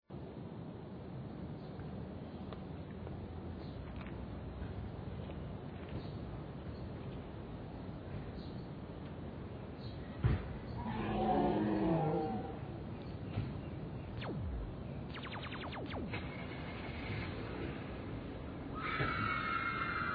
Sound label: ambience location